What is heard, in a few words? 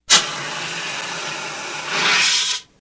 Fire Extinguishe; fire extinguisher